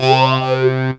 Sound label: SYN C 5; Best Service Gigapack 1